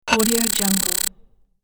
scifi loading bar

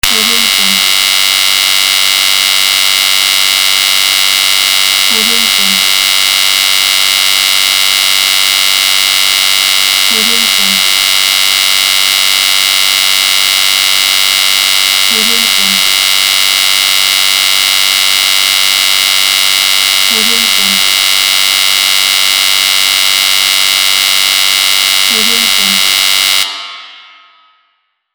electricity hum

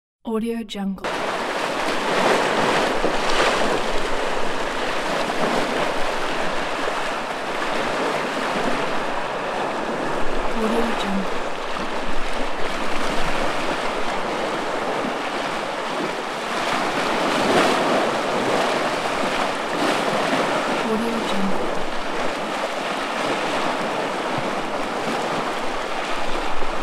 ocean waves

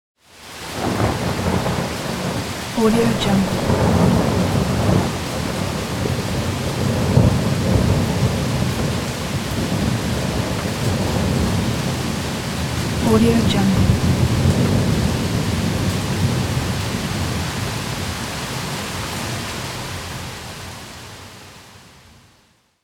thunder and rain

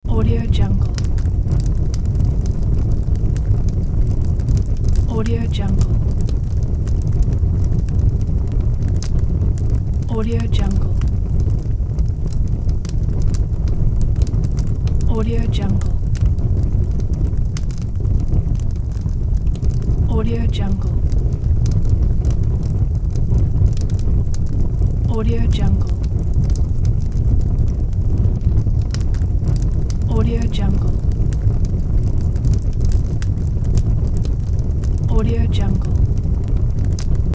fireplace warmly crackling; hollywoodedge

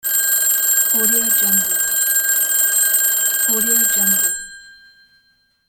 phone bells

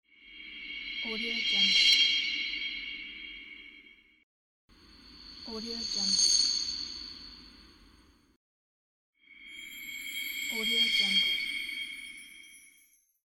shining glowing bow

